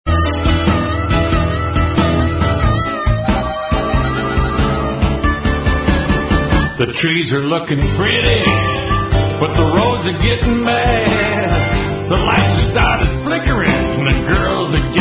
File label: Blues; Lake Effect Blues